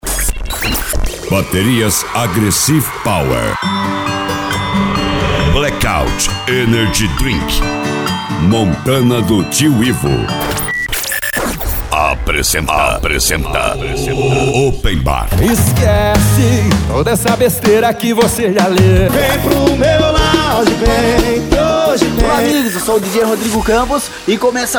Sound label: Sertanejo; OpenBar Vol.18